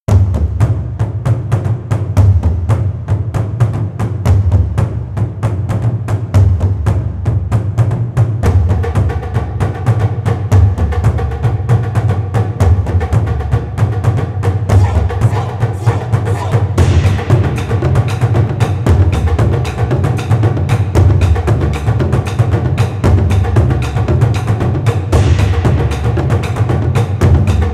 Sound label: Taiko; Taiko soul; 和太鼓の熱い曲です。; BPM:115